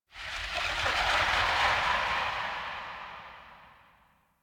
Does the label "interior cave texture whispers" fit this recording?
Yes